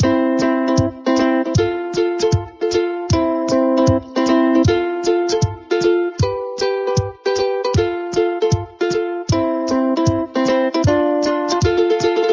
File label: Level Theme